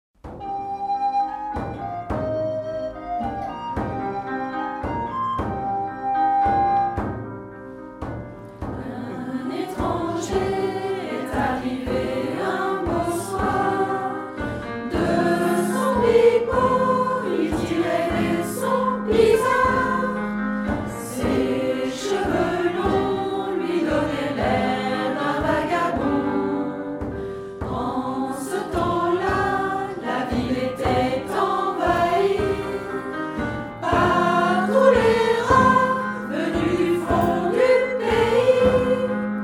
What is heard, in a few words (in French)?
Le joueur de flute